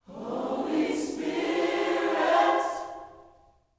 H SPIRIT, Gospel

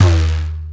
dum, pitch perc; East West - Global Sounds

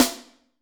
Snr Hi Clas, Alesis D4

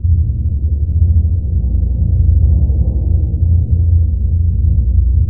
TMP C#, Synclavier Percussion

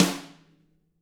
TAM RIM, DW TAMA

